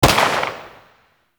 Uzi Pistol Shot; Warframe; Grineer

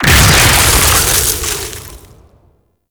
Arctic Snowglobe Burst; Warframe; Eximus

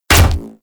Full Draw Shot, Weapons; Warframe; Infested; Cernos

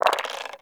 Open Dice Movie; Cetus Vendors; Five Fates

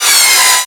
Miter Metal Impact; Warframe; Tenno; Hit Effects

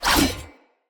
Dendrit Dagger Whoosh, Swords; Weapons

